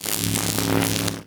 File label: Arc Rifle Fire Random; Infested